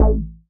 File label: moog A; Techno Trance Essentials